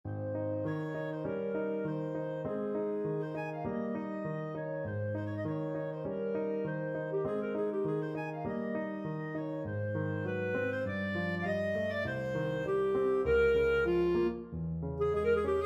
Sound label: chopin nocturne, clarinet